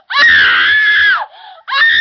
scream

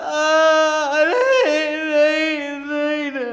cry

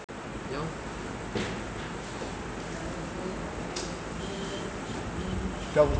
inside room, indoors

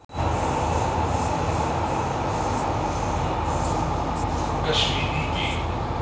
metro, outdoors

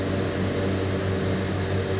exhaust, machinery

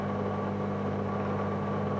microwave, machinery

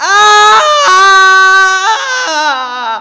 scream

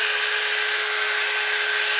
electric shaver, machinery

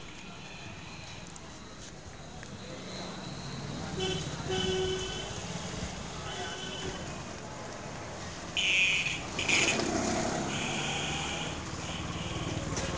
market, outdoors

